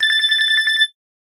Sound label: Simple Beep; LG Optimus One P500; ringtones